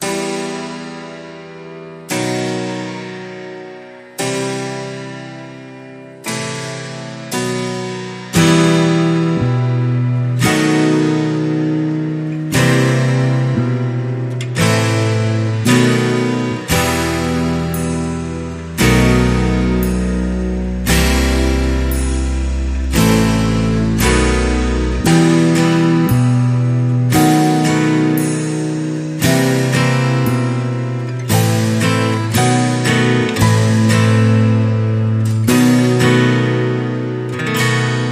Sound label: Jester Laugh, Mysterious